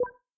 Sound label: key Pick Under Water; todee and todpee